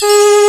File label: DG WAVE, DIGI SYN; AKAI S6000 CD-ROM - Volume 1; SAMPLE WAVE MIXING 1